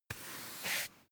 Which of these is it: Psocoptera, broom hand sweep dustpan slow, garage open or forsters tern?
broom hand sweep dustpan slow